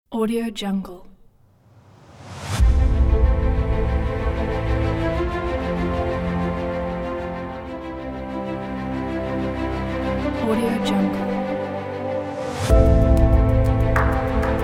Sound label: Epic Cinematic Trailer Dubstep; KarsRoia; AudioJungle